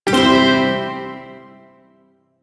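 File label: Shawzin Pentatonic Major Chord; Warframe; Duviri Shawzin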